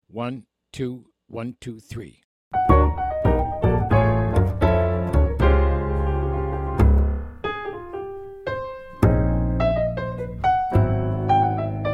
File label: minus drums